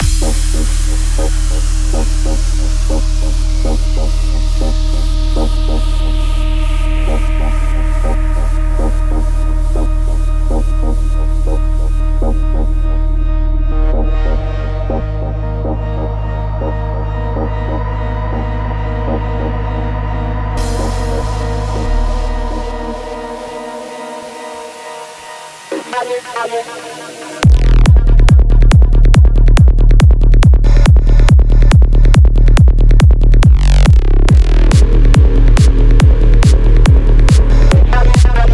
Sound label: Psytrance; Unison